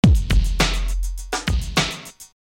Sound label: drum beat